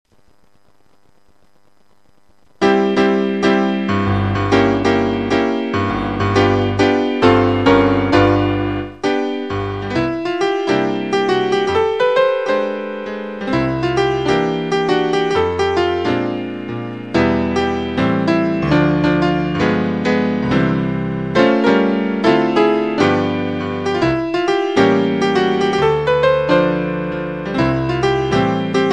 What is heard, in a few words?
Christmas Piano